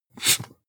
marker mark hard short

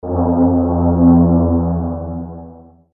metal scrapes

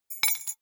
key ring small drop stone soft

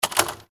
mechanical switch

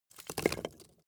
wood logs small fall

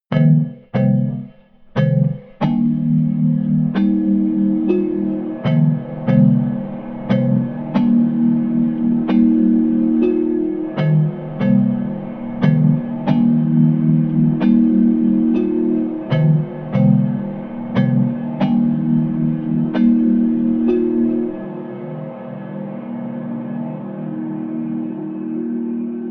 sequence dark vibraphone

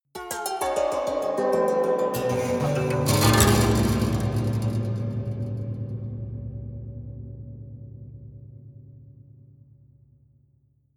haunted strings down stroke slow hard

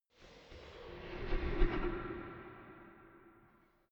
small impacts

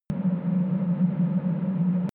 drone hollow wind

